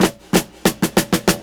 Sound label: DRMROLL; E-Lab; X Static Goldmine 2; akai